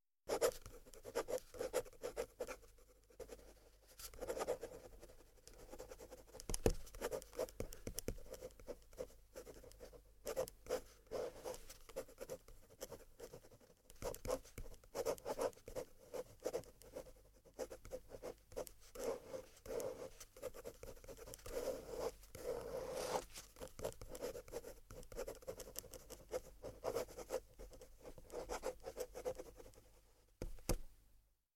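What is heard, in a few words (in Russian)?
карандашом пишут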